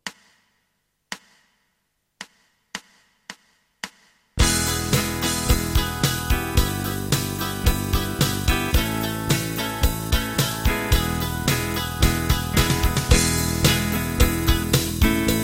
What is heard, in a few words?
E semibreves, Guitar backing